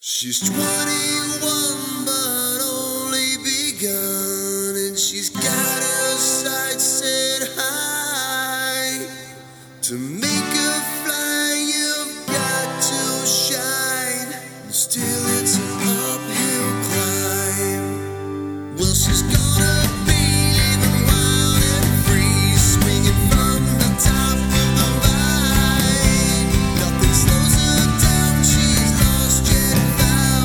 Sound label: harmony karaoke